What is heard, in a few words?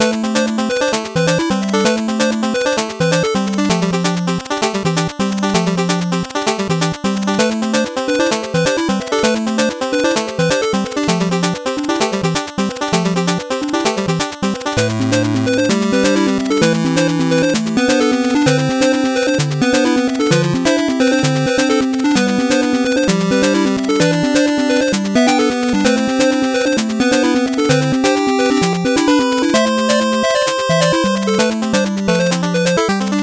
8bit bgm fast